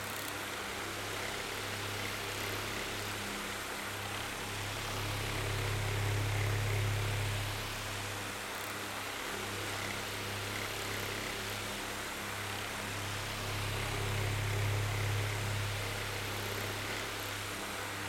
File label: fan